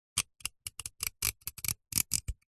household comb metal teeth scrape with sharp metal